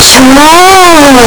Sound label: pikachu cry; pokeyellow